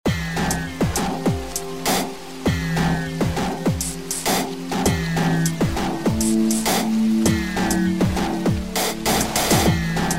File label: beat